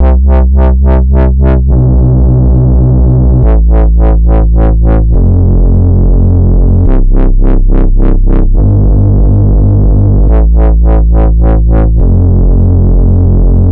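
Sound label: Bpm140 Bass Loop C major Am F major; Prime Loops; Dubstep Illusions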